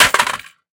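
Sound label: rifle drop concrete; STALKER; IWP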